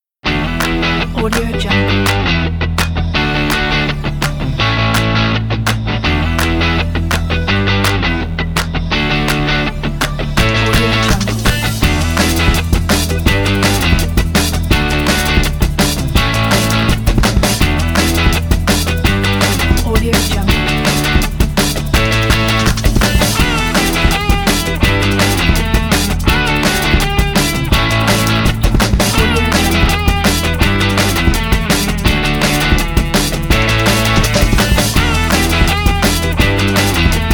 sport rock is sport rock

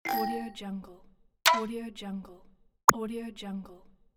score point

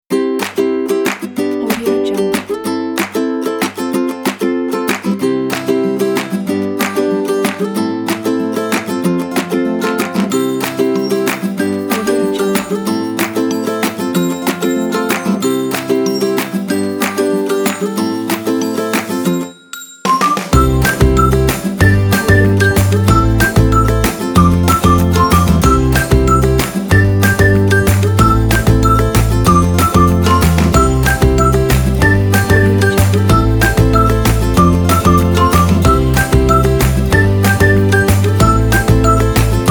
happy; rainbowsounds

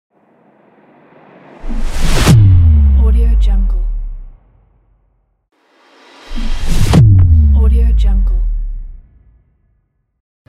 slow motion

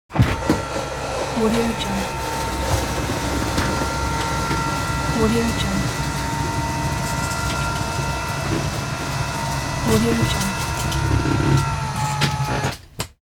rv camper lowering canopy motor; applehillstudios

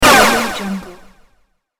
zappy laser echoes

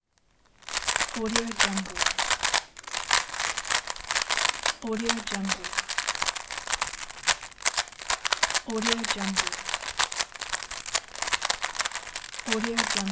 solving rubiks cube

